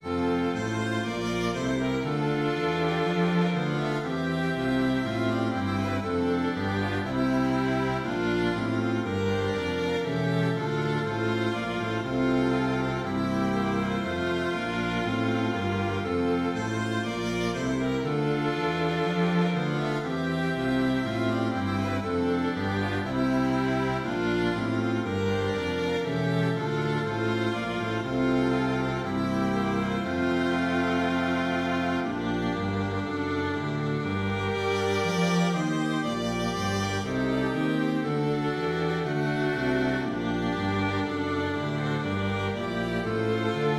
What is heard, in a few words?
Strings; Hail Thee Festival Day, or Salve festa dies; easter f major